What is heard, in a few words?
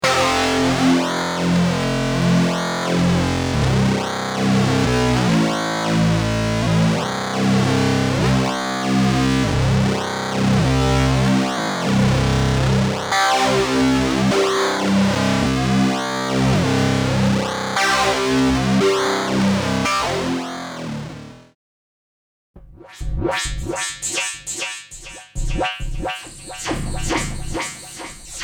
Kaossilator